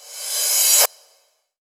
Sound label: Reverse Cymbal; Best Service; House Definition VOL-1; Cymbal Stuff